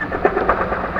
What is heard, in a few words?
MACHINES; Masterbits; Tekkno Trax; MACHINES+FX